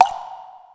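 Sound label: Cabasa, Yamaha SY22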